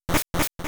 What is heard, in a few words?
exit door; Pokemon Essentials; Pokemon Circon BETA 2 v1.8